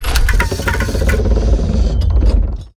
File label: e skill gear appear